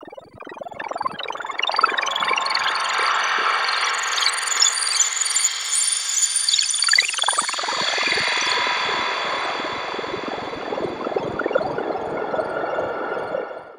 alarm; Masterbits - Tekkno Trax; MACHINES+FX

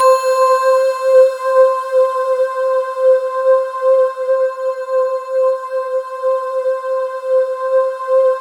C5, Synth Leads

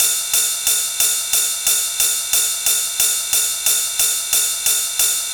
Cymbal Loop; Sonic Foundry (Sony Creative Software) - Fluid Dynamics Drum & Bass; Construction Kit

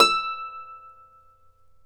Celtic Harp, Plucked Strings

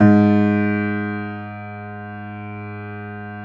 JAZZ G#1, Keyboards Acoustic; AKAI; USB Soundscan vol.17

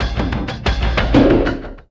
Cym Drum Wak Woo, Frame Kendang; Sonic Foundry (Sony Creative Software) - Friendly Fire; Drums

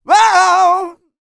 R ROCK, MALE HUMAN; ILIO; Vocal Planet

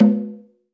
AFRO.TAMB, AFRO.TAMBORA

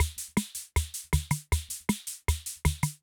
Loop, rhythm king; classic machines

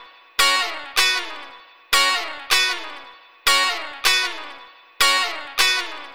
Gt Chikz F#; Lounge; Zero-G Kocktail Kollection; Construction Kitz; Flutes & Swingers 156; 90 s Sample CDs

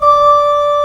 FLUTE D5; AKAI S-Series CD-ROM Sound Library VOL-2

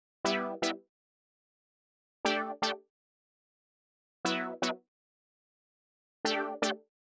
120 Stabs A, Keys, Nu Jazz House; PowerFX

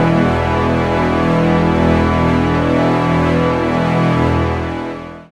SYN DANCE; Sound & Vision - Gigapack II

